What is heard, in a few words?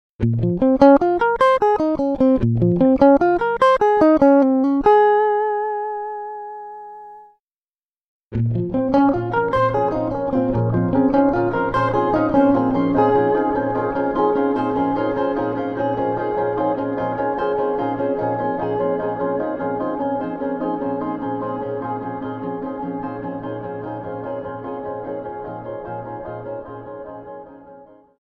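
Electric Guitar arp riff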